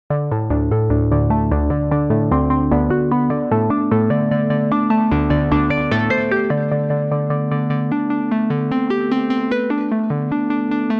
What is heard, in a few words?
FM Arp